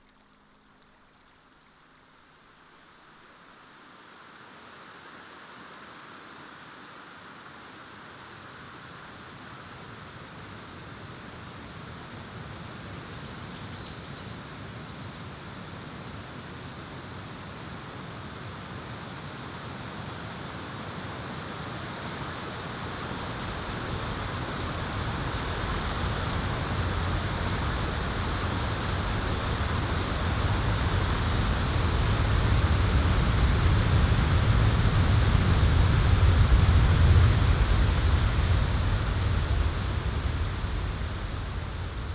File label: nature soundscape